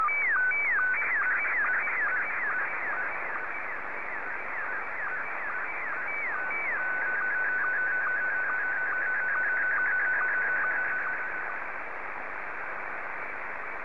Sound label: sstv; VK7NMH